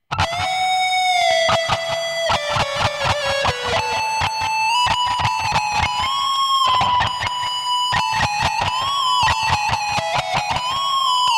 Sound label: solo